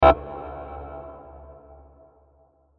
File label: Sci Fi Beep Low; Tech; Blastwave